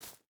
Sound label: footstep barefoot grass jump start; Rust